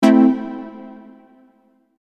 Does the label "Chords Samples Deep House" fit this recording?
Yes